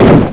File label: Gun (mortar)